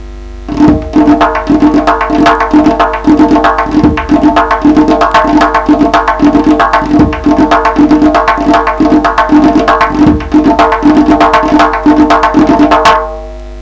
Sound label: 12 beat rhythm using takriz